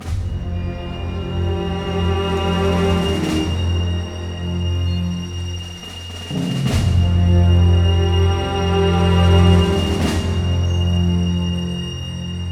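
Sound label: 97 danger, orchestra; East West; Scoring Tools; Danger There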